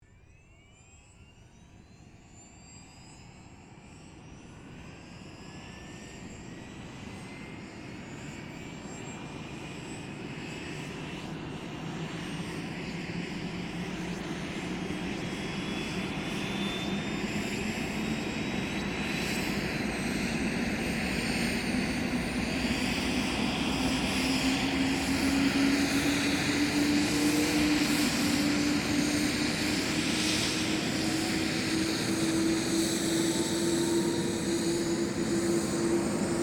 Helicopter Start, Aircraft, Helicopter, Start, Up, Idle, Hh-65, Dolphin; Transportation; BlastWave